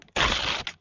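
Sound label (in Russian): разрыв куска ткани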